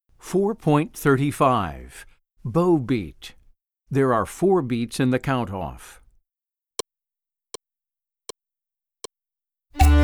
Bow Beat

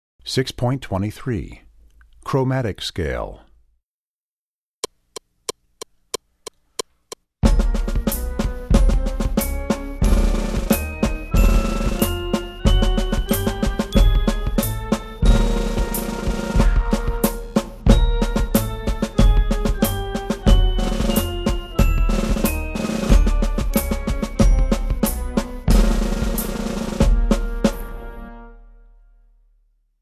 Chromatic Scale; Percussion Book 1

